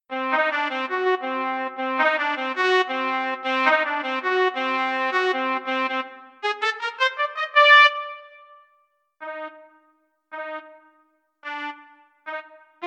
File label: trumpet; holiday brass